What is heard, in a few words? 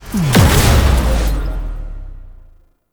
Exalted Sniper Cast Impact; Frumentarius